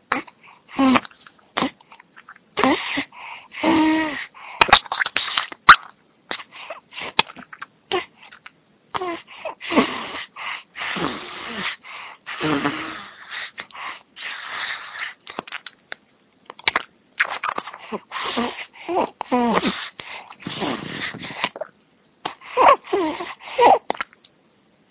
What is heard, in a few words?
eating